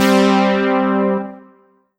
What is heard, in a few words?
5th Saw C4; Korg NS5R